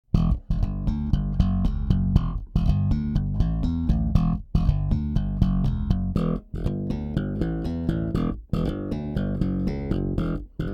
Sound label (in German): EBS Charakter ON Pickups Mid Hals Steg; SKC Bogart